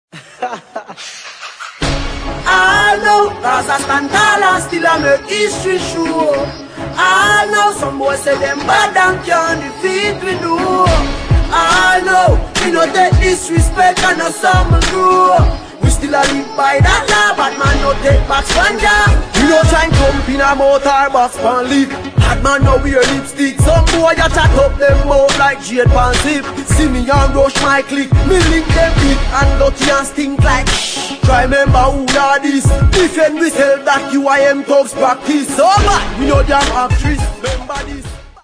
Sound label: riddim